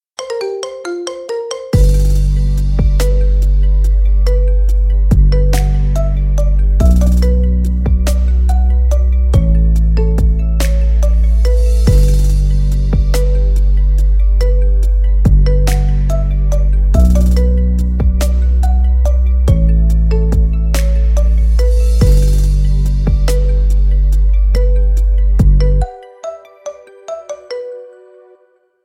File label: marimba remix